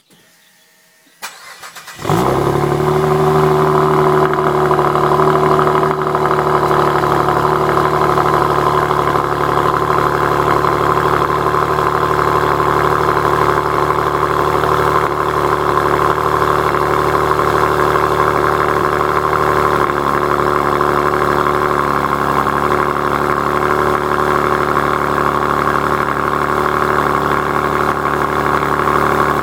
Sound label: TurboX, Saab Turbo X